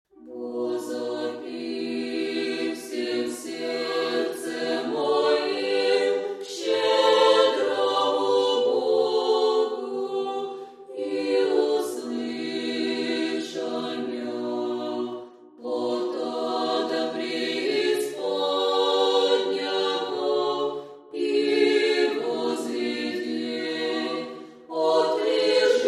vozopih vsem serdcem moim irmos 6-oy pesni velikogo pokayannogo kanona